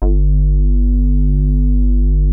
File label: G2, Synthesizer, JUNO 60; Hollywood Edge - Giorgio Moroder Rare Synthesizer Collection